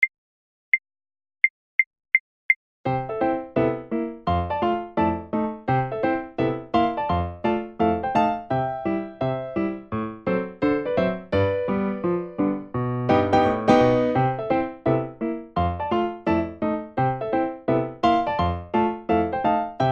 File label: Foxtrot; Seiber